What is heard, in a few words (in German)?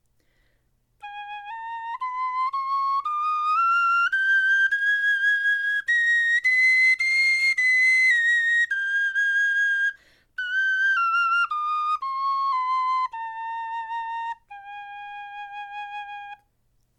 tonleiter